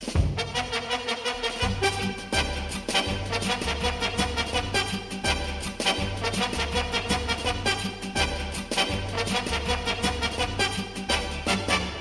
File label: Trumpet